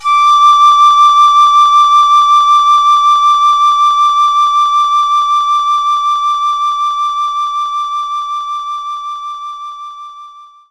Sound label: Piccolo D2